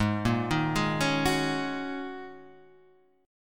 Ab 7th Suspended 2nd Suspended 4th, Guitar, Standard; Ab7sus2sus4 arpeggiated; 4,1,1,1,2,2